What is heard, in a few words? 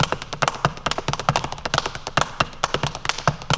TAP DANCE; Zero G - Ethnic